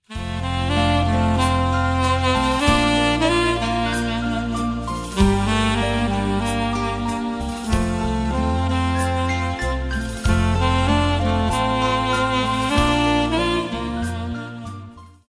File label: instrumental; Ill sing a hymn to Mary